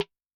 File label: Snare, Yamaha DTXpress-II